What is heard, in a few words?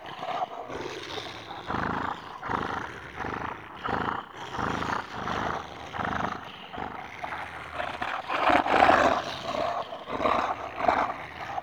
shroud loop; Warframe; Voruna